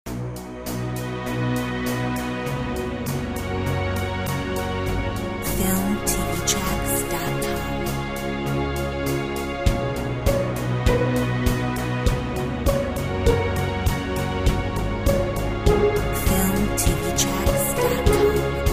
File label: Ambient Pizzi